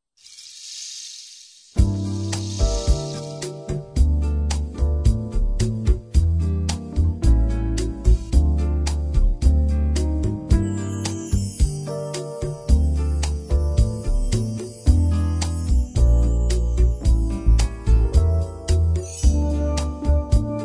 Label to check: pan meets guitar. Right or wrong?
Right